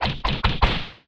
Fight Combo x4; Rogue Waves; Anime Studio

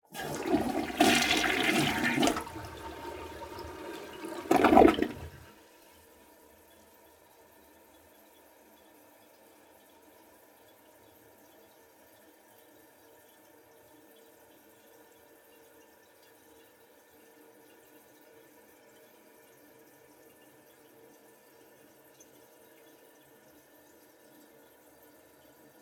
Toilet, Domestic, Flush, Seat Up, Fill; Bathroom; Airborne Sound

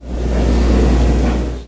shower hit; hl2

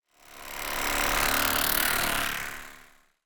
Whoosh Shaver Pass By; SoundBits; Just Whoosh 3 Whoosh Essentials

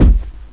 foot heavy, antlion guard far; hl2

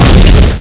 explosions; hl2; impact

